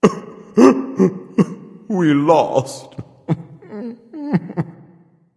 boo hoo; smite

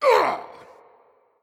hitreact human male large

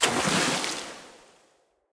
fire impact; smite; poseidon

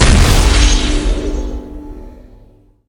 explode; smite; chronos

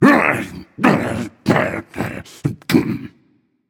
grumble; smite; anhur

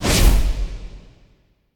dash; Smite; Cabrakan

